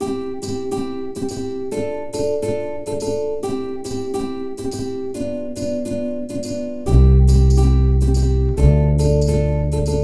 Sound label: cute adventure loop, ukulele